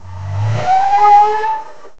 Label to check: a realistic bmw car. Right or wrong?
Right